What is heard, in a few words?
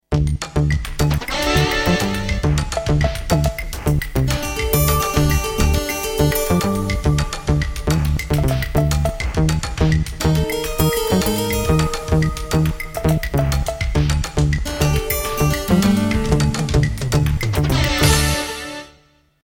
Jingle